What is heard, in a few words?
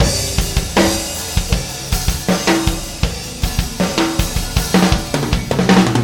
loop